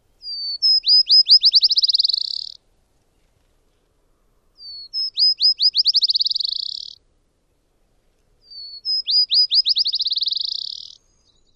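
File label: song, Black-chinned Sparrow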